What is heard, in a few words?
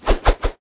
anchor cast; cstrike; war3source; tidehunter